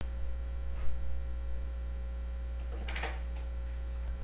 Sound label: bowl